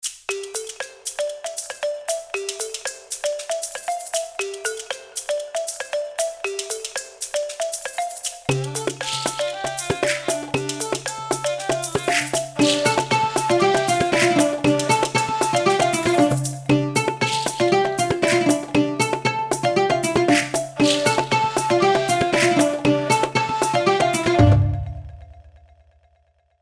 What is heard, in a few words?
Kontakt7 Acoustic